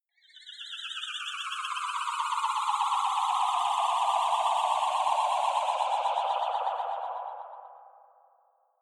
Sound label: ufo classic wobble down